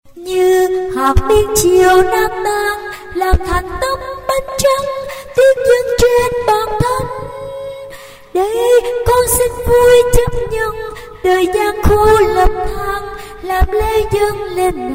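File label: soprano alto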